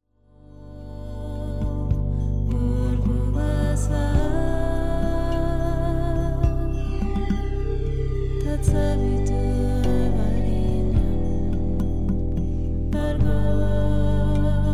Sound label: gayatri; Lokaha; Meditation Series